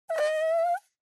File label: Poo Poo Cushion